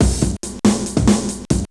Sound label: DRUM LOOP BIG BT 140; eJay; BigBeat